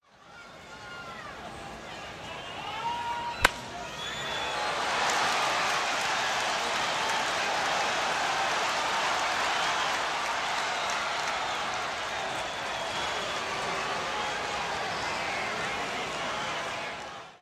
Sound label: Baseball game crowd cheering